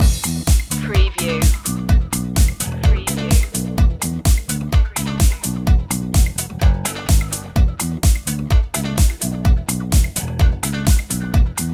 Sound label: funky juice loop